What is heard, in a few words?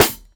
crisp snare one shot g key